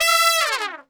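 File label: E4 trumpet, Brass Super Section; Best Service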